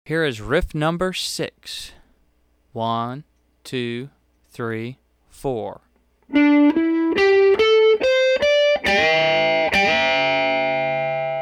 Hot Licks Riff

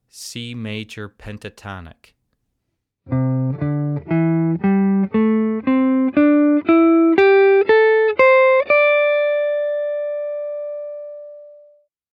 C Major Pentatonic

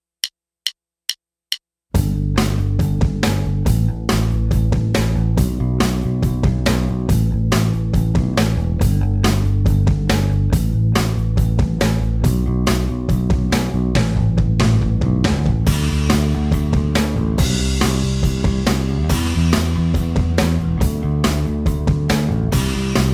Acoustic Power

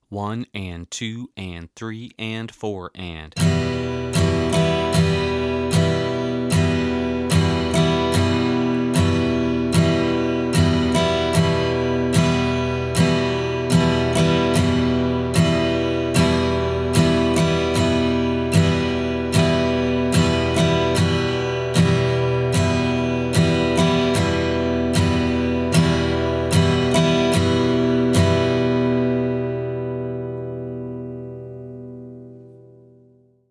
E Minor Chord

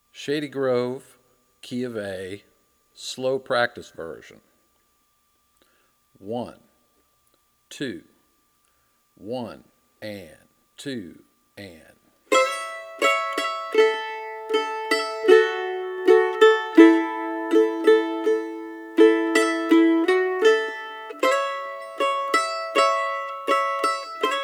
Key Of A Practice Version; Shady Grove

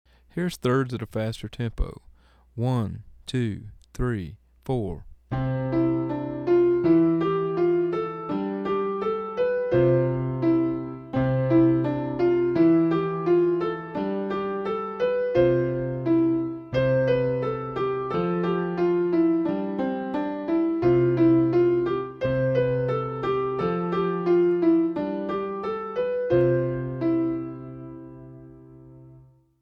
Thirds Fast

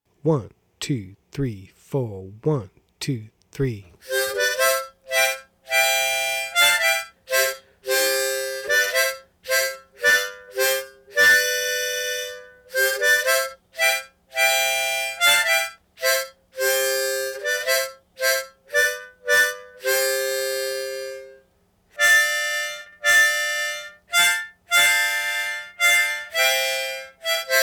Oh Suzana Slow

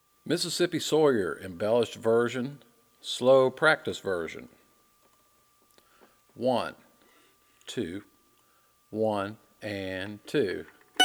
Mississippi Sawyer Embellished Practice Version

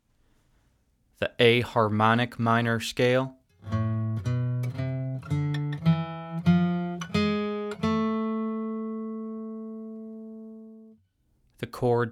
Harmonic Minor Chords

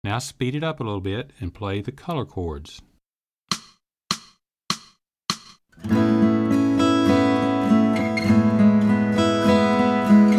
Color Chords Fast

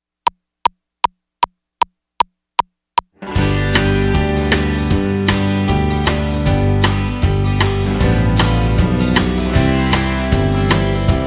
Will The Circle Be Unbroken W/O Guitar

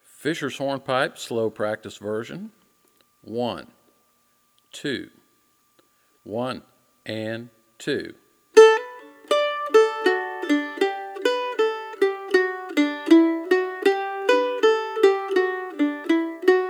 Practice Version

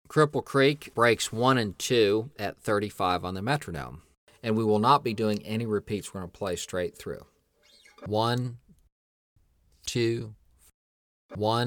35 Bpm; Cripple Creek Breaks

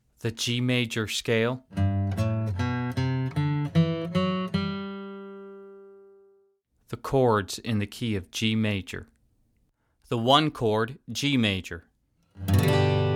G Major Scale Chords

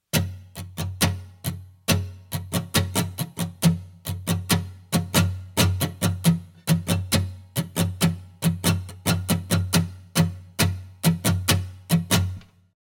Muted Strumming Drill

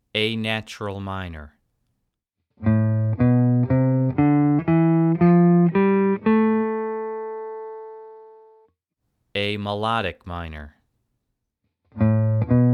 Melodic Minor

